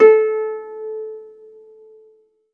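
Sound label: HARP B4 SUS, Strings; ILIO; Synclavier Strings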